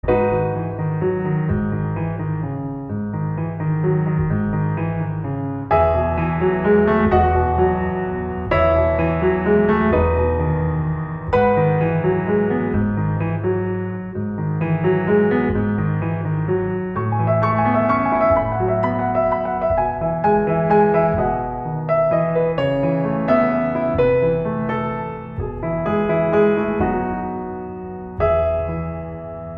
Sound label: Solo Piano; MIUI12